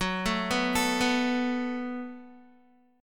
F sharp Minor Double Flat 5th F sharp down Guitar; arpeggiated

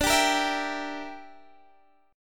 E Minor 7th Flat 5th D

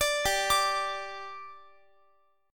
G 5th G Guitar, chord; G5 arpeggiated; x,x,x,19,19,21

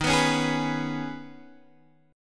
D sharp 7th Suspended 4th Sharp 5th D sharp; strummed; x,6,9,6,9,7; D#7sus4#5; JGuitar

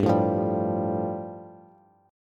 G 7th Suspended 2nd G, Bass; G7sus2 strummed

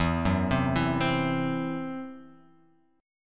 E Suspended 2nd Sharp 5th E, down

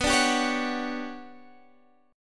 G Major Sharp 11th B, chord

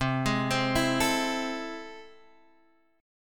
A Minor Major 7th, C

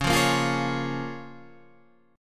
C sharp 6th C sharp down

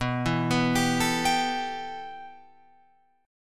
B 7th Suspended 4th Sharp 5th, Guitar, Open F; B7sus4#5; arpeggiated; JGuitar

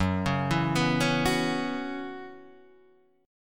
F sharp 7th Suspended 4th, F#7sus4 chord; F#7sus4 arpeggiated

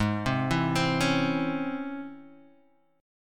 Db Minor Major 7th Ab

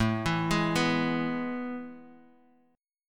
A Major 7th Suspended 2nd, Guitar, Half Step Down; AM7sus2

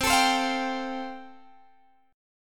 F Major Add 9th C, Guitar Standard; Fadd9/C strummed; JGuitar

